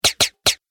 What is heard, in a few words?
Silencer Gun 2 Hits, gun shot; Silencer Gun - 3 Hits; Impact Sound Effect